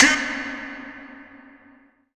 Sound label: Vox Hit C3; FX; Club Techno